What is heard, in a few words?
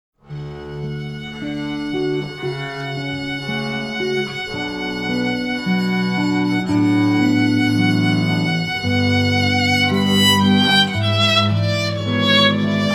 String Trio; Air on the G String; J.S. Bach; International String Trio